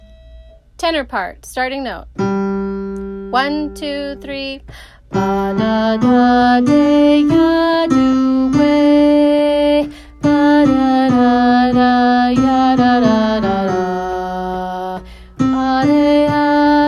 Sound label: even the pain tenor